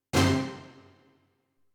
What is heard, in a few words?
Orchestra hit MU50XG